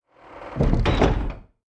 Truck Back Doors closing

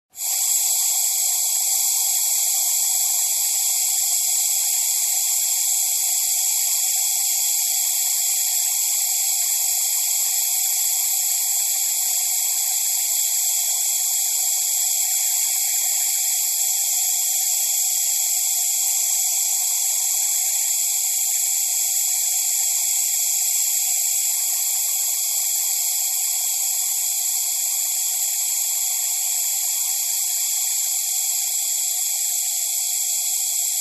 Oxygen Tank long hiss

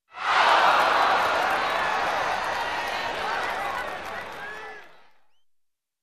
Crowd clapping and cheering

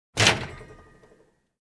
Steel Door close slam

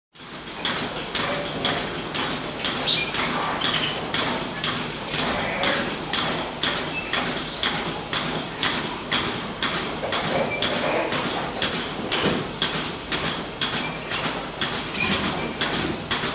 Farming machine operating in hall

